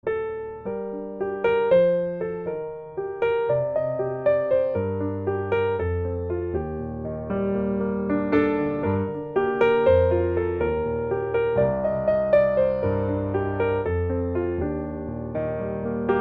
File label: Blue Piano Version